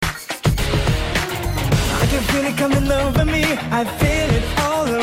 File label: Game Tune